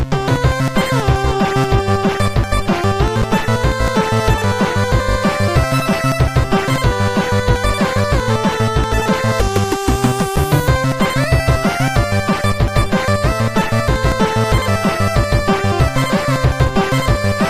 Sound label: credits music; usbloadergx